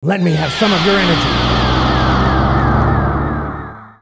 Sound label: charge; dbz; goku spirit bomb